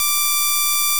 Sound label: timevarying low blit; dafx12 nonlinear phase oscillators